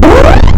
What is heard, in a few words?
Fart